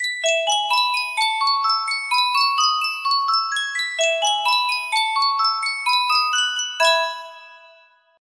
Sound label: Sankyo miniature music box